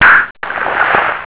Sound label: bottle open